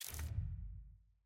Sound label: scroll click